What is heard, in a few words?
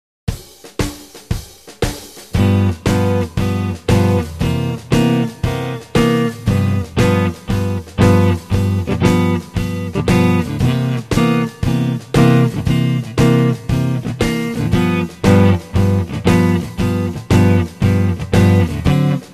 Rhythm Guitar